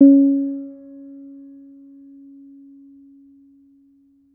Note Mid Soft, Piano; Piano Miscellaneous

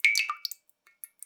Drips

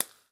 Frying Bit

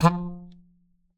Melodica Short Note

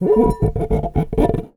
Finger Rub, Large Wine Glass

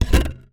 Remove, DVD Drive External; DVD Drives

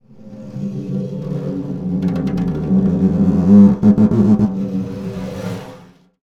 Snare Drum; Percussion Samples

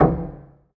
Ped Noise Etc Sgl Knock, Piano; Piano Miscellaneous

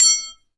Aluminium Tube Drop

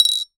Marble On Base, Large Wine Glass

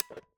Single Shunt, DVD Drive External; DVD Drives

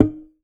Classical Guitar Extended Technique

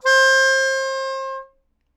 Melodica Long, Long Notes Chromatic

